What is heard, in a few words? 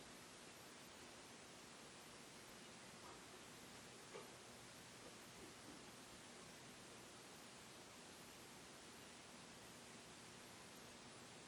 Inside Tattoo Shop